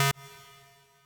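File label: square lead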